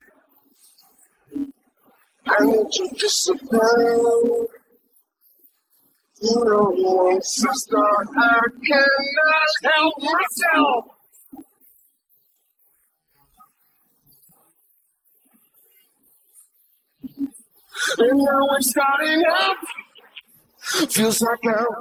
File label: discipline vocal